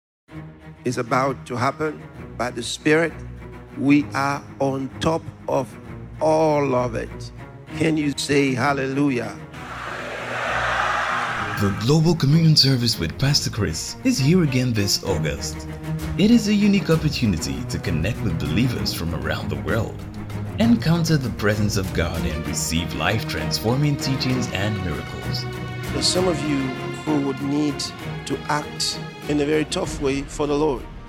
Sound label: JINGLE